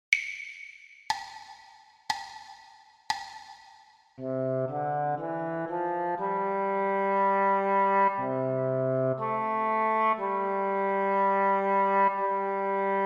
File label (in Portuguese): percepcao ditado